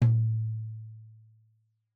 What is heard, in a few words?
Tom2 Stereo, Deeply Sampled Drums; SMDrum